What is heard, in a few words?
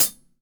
DW Red Maple Hi-Hat Closed Stereo; Sonic Foundry (Sony Creative Software); Vital Drums